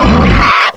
pain, strogg; Quake 4 Alpha; id software; tik

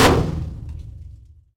Metal barrel hard; Doom

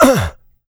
pain light, player; Doom

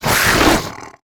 imp attack melee, monsters; DoomRTX

